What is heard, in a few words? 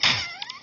Rumble spikes sound